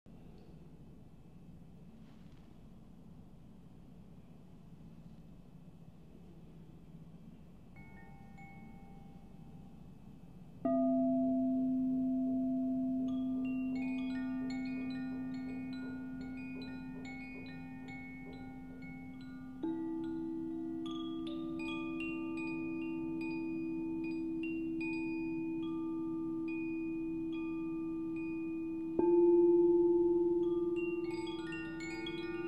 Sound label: Singing Bowl Meditation; guided; 20 Minute Meditations